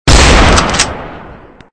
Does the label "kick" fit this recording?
No